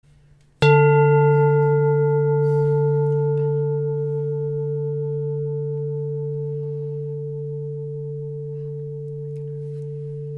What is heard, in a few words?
chakra bowl